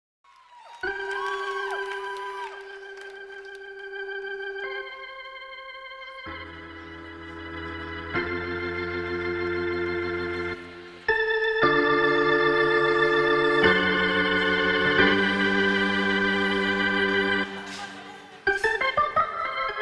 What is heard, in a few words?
Organ Worship